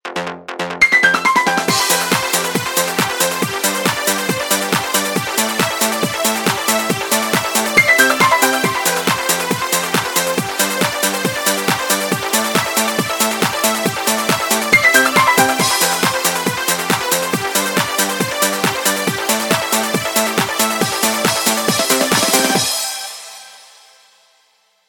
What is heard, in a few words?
mix ringtone